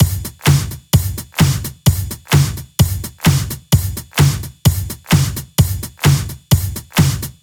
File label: 129BPM Kit; Vengeance Samples; Micromax Kit